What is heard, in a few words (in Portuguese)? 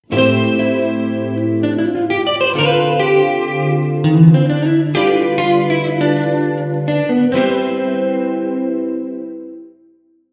Cadencia Am7 Dm7 G7 C7M